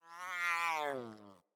Kitten Yawn; Catbrow

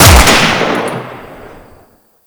Grineer Lever Action Rifle Shot; Weapons

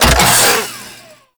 Saw Blade Launcher Mech; Warframe

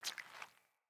Boot Walk Water, Footsteps; Characters; Enemy; Boots; Sinecure; Melee Grunt

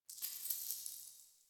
idle head shake; Tagfer

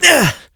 Adult Operator Melee Swing Exert; New War

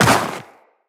Walk Snow, Footsteps; Tenno; Characters

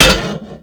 Cast Iron Hit; Warframe; Awakening Remaster

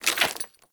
Generic Foley; Warframe; Dagath

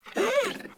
Puppy Interact Affection Open Mouth Short; Vocals; Warframe; Kubrow

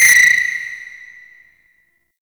Security Camera Soft Detect Start; Ambience; Gameplay; Warframe; Grineer; Fortress

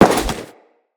Crewman Step Default, Footsteps; Corpus

